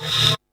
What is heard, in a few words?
noise